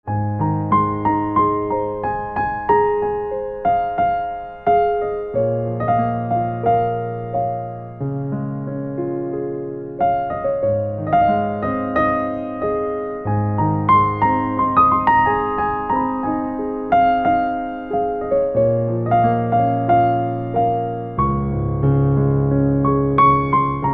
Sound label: Piano